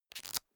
small book riffle through fast

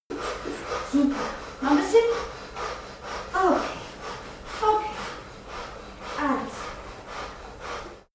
birth breathing fast

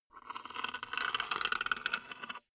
wood board scratch

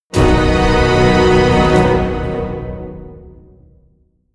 medieval jingle orchestral positive

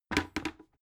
bucket handle drop

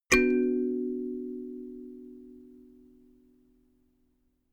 notification kalimba triad minor low

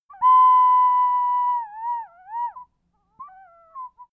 ocarina collapse

